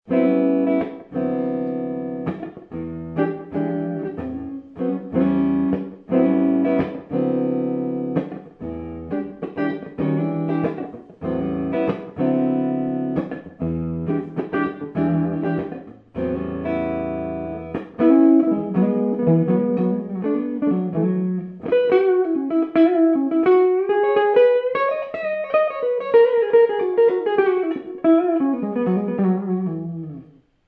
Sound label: EG2 neck tapped, guitar; The coil-tap feature on the rhythm pickup allows for some very sweet "in-between" tones.